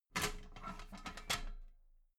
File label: metal ladder small handle unfold soft